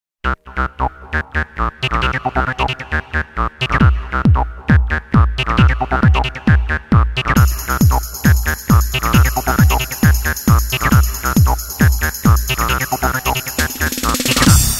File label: Electro Nite; Soundzabound Volume 5